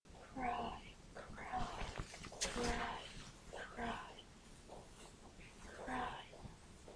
cry

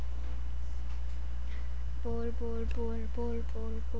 bowl

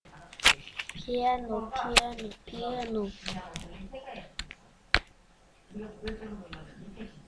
piano

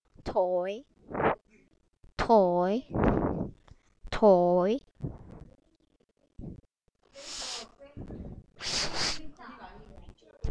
toy

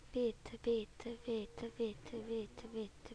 beat

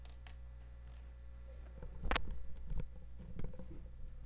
rake

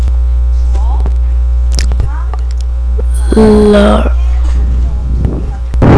laugh

